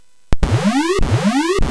Blob, MOS6581R4; hvsc

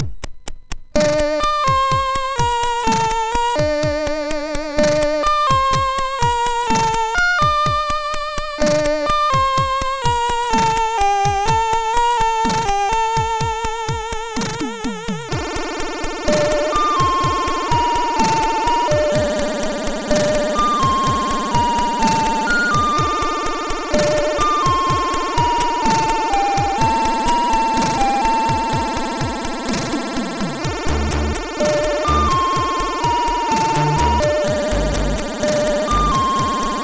Fantasia Country, sid MOS6581R4

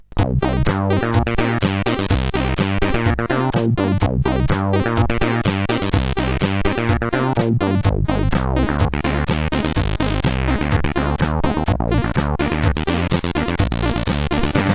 Swinging Beat, sid CSG8580R5

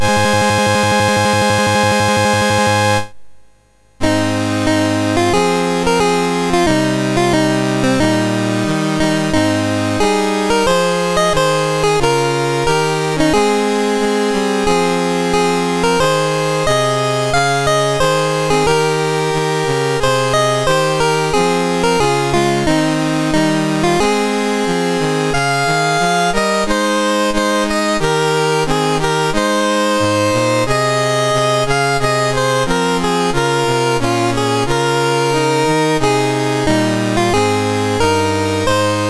MOS6581R3, National Anthems; Mongolia; Dave Marquis

